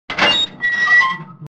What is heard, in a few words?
containers doors